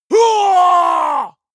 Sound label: male combat attack long